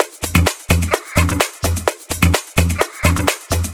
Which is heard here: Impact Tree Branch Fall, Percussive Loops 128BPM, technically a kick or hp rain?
Percussive Loops 128BPM